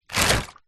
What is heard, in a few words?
small plastic bag filled with water pick up from wood surface; zapsplat